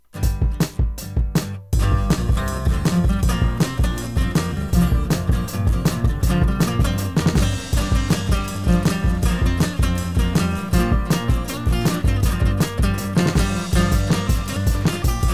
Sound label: Bass